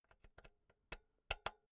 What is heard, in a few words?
insert jack cable slow